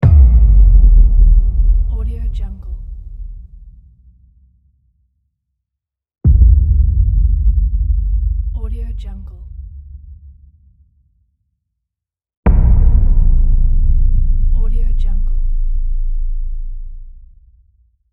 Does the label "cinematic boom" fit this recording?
Yes